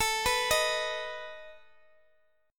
A Suspended 2nd Flat 5th, Guitar, Half Step Down

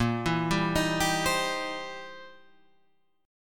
C 7th Sharp 9th, A sharp

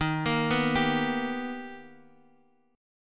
B Augmented Major 7th D sharp, chord

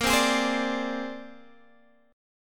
C 7th Suspended 2nd Sharp 5th A sharp

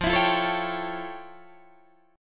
F Augmented Major 9th, Guitar, Open D; 15,19,15,15,16,17

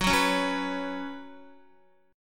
F sharp Suspended 4th, Guitar; strummed

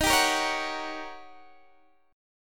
Eb 7th Sharp 9th Eb, Guitar Open D; x,18,17,16,16,16; down

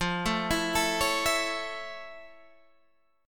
F Augmented Major 7th F Guitar Open G

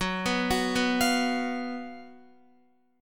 Gb Major 7th Suspended 4th Gb Guitar; GbM7sus4; arpeggiated; x,9,9,11,11,12; JGuitar

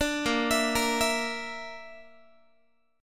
Bb Major Flat 5th, Guitar; BbMb5 arpeggiated

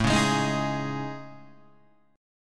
A Major Sharp 11th, Guitar; AM#11; strummed; JGuitar

